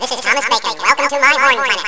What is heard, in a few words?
chipmunk